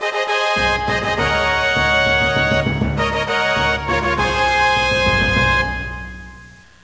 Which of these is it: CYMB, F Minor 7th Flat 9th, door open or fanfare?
fanfare